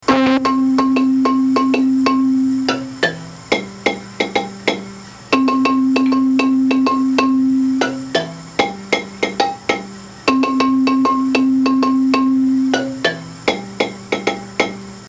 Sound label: bass signal, marimba; manhanga